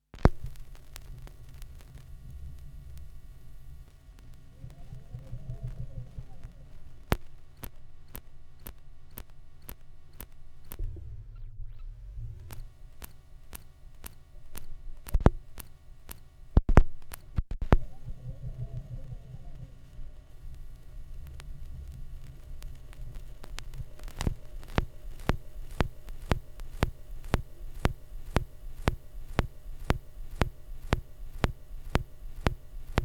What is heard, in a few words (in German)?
schallplattengeraeusche, Vinyl; wendelrekords 02